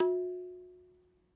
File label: Na, Tabla; Tabla Kit Large